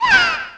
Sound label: die magician female